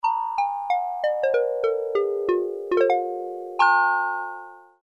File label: VIBE, Kawai SX-240